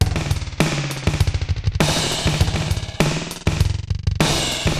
Digital Delay RX17 (100BPM), cyborg beats

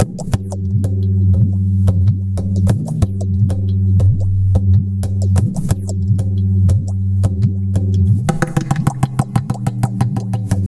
tabla, 90 bpm; Zero-G; Pure Tabla

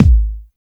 POP KICK; Drums In Your FACE!; BONUSKIK

